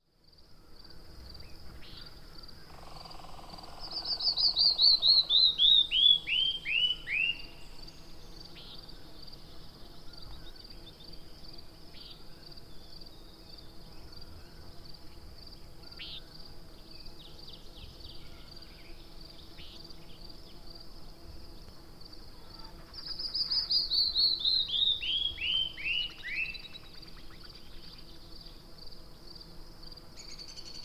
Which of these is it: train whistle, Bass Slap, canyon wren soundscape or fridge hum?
canyon wren soundscape